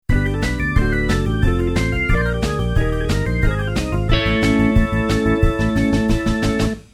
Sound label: 180bpm; tempo